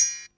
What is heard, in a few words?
Percussion, House